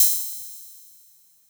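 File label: Vermona DRM2 hi hat open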